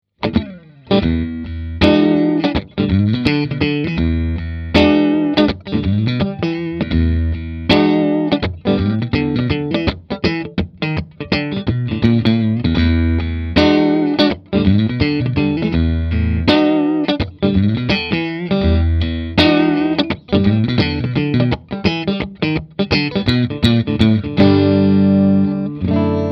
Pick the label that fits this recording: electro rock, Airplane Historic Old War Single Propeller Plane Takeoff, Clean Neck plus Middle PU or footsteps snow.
Clean Neck plus Middle PU